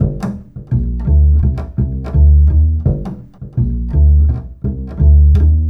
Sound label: Acoustic Bass